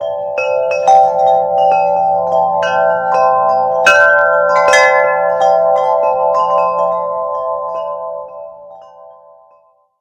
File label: Black Wind Chimes; medium